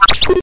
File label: beepy; Duke Nukem